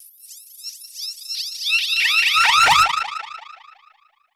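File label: alarm siren, filter processed, 170bpm; musicradar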